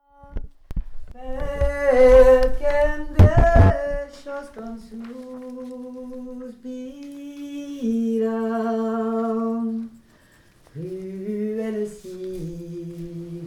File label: Cruela VOIX; Cruela Si tum vos aimar